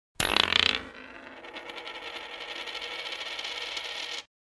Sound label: Coin spinning on wooden floor